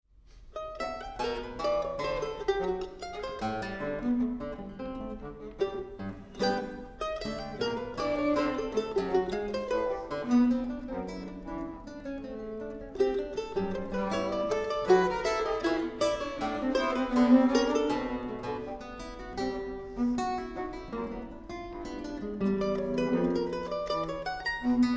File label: samba